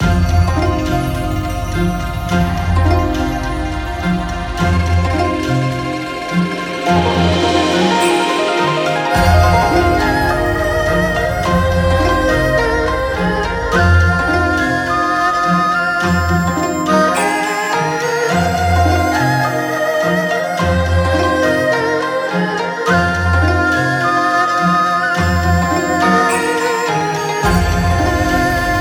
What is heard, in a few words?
Wuxia Healing loop